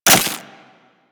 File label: Melee Impact Glass; Warframe; Weapons